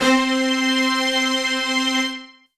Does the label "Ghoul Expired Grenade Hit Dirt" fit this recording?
No